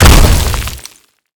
Projectile Sounds; Red Orchestra Ostfront 41-45

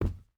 footstep rubber boots cloth jump land; Rust

